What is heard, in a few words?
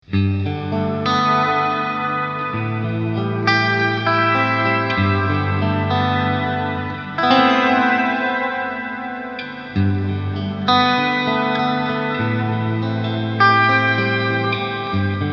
shimmer; MOD Devices MOD Duo